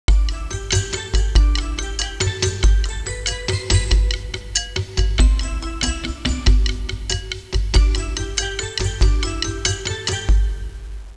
suonerie musica hawaiian

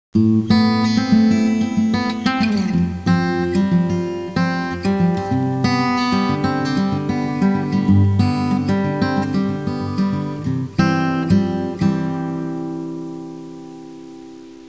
suonerie contemporanea; musica contemporary

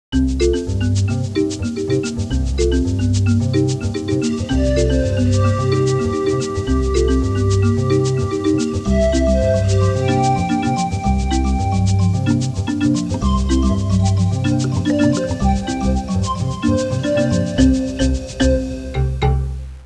suonerie musica ritmica

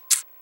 suoneria botton; beep

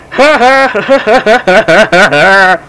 suonerie persone risate

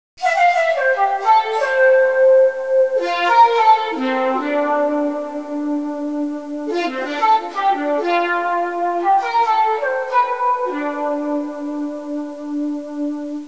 audio suonerie musica mistica

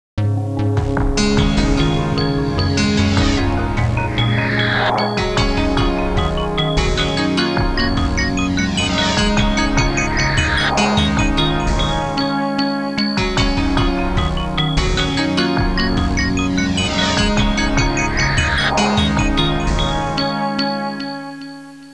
musica elettronica

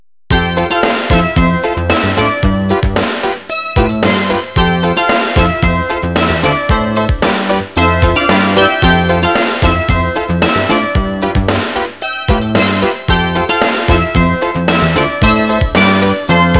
suonerie businessy

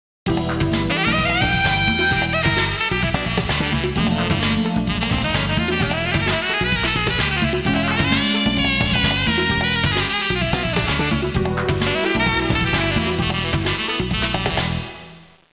suonerie musica jazz

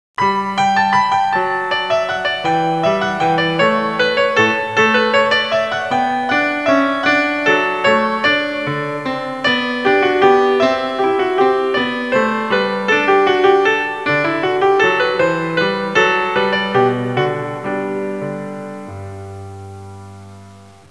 suonerie classica; musica classical

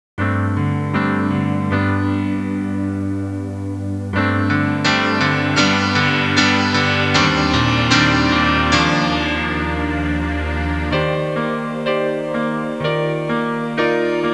musica newage

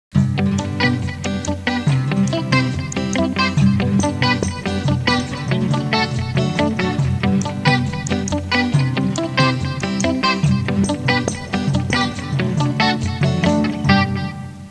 suonerie musica hawaiian

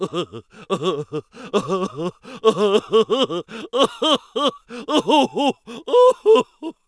suonerie persone risate

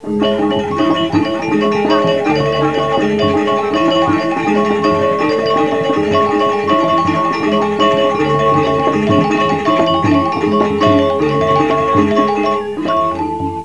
suonerie musica ritmica

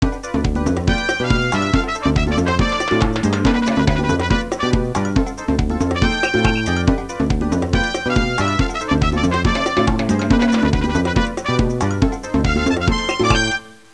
musica traditional est; fareast

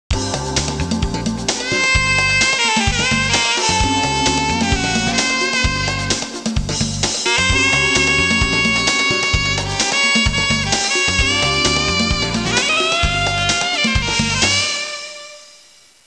musica urban

